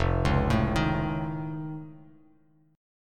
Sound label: F Augmented 7th F, Bass Standard; arpeggiated; 1,4,7,8; down